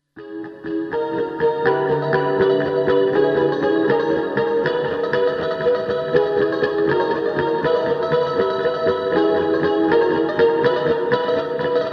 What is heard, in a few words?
Guitar Delay